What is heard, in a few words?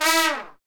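trumpets FX, Brass; Roland L-CDX-03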